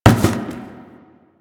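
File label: Cardboard Box Impact; Warframe; Ambience; Nineteen Ninety Nine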